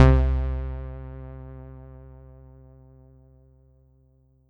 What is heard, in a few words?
Ensoniq ZR-76 Analog Bass C2